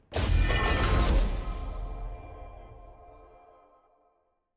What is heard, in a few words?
loot open promotion intro